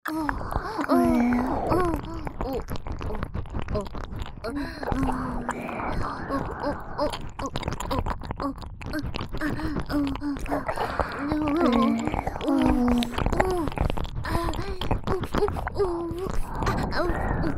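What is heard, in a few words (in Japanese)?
オホ喘ぎ SE無＋ぐぽぐぽW耳舐め; 3WAYループ; おまけループ（オナサポ）; 由紀＆華恋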